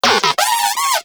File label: Moa Compassionate Vocal Enemy Spotted; Warframe